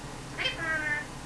pretty bird

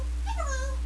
peekaboo

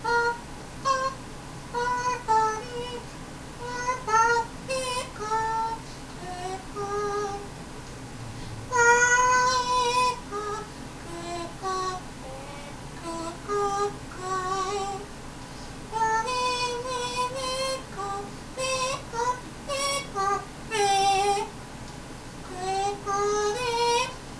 singing

